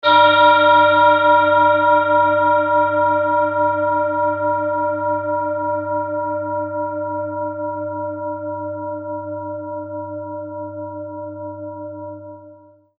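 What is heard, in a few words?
tibetan bowl, g sharp; uranussaturn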